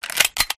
potato smg switch

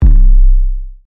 arp

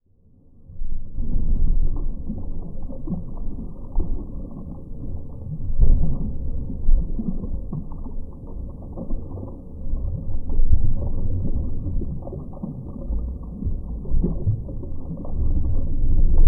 music default

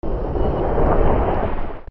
fire

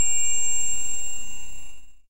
tinkle

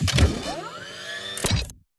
Missile Pickup

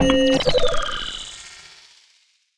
sent to space

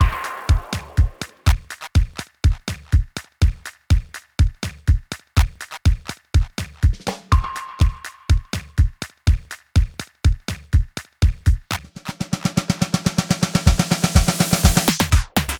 DRUM

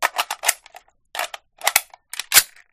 reload; resurviv

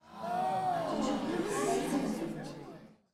crowd Reaction shrine small Reveal; spellbound

